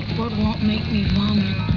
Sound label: VOMIT